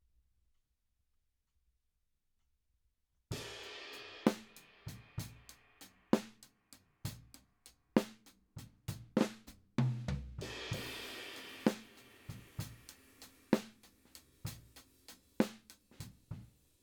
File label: Drums